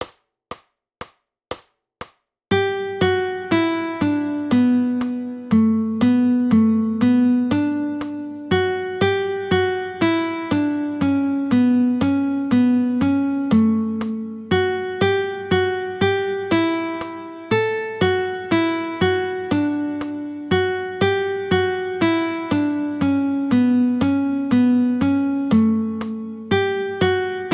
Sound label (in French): gigue, guitare